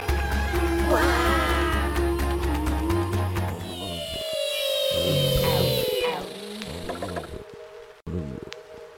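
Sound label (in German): übergang region; botanicula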